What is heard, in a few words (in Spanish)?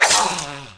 apunyalar